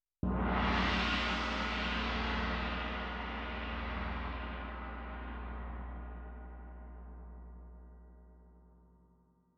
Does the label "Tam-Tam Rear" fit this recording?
Yes